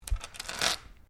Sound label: Dice Mixed Glass Table Pickup; Variety of dice sounds; Casinos